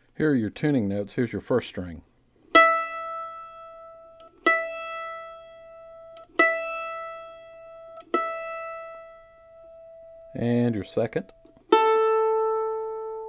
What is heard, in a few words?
mandolin tuning notes; beginner